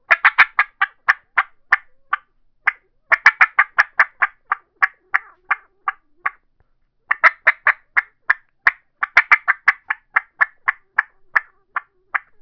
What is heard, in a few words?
dynamite mouth call cutts, turkey; dixie